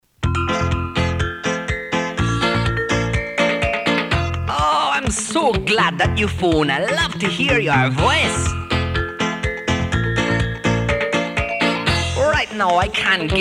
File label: Telephone Tones Jamaica Hello; ATT Telephone Tunes